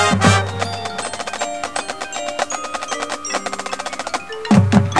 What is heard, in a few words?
snare